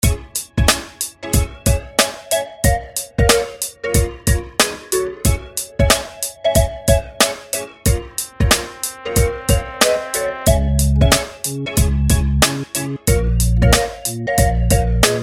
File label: Instrumental